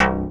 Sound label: syn b1, SYNCLAVIER Bass Metal Vel; Retro Sampler